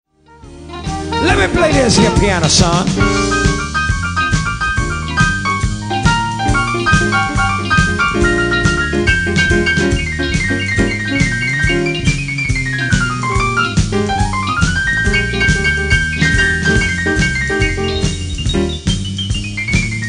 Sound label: blues